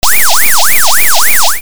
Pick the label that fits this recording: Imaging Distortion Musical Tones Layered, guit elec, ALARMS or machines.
ALARMS